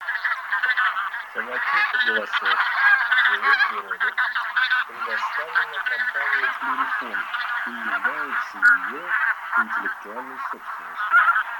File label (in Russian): Гуменник базар Oche Granaiolle